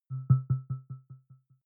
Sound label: button echo resonance; soundpool